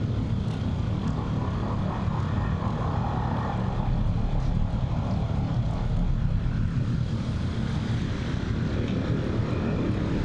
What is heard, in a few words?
ship corvette idle; Stellaris; machineage; ships